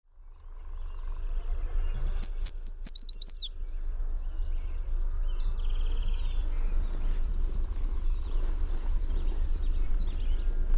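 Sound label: Yellow Warbler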